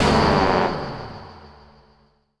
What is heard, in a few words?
Loss; Sid Meier's Civilization 4 Complete; Beyond the Sword